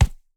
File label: Punching Percussion, Straight Glove, raw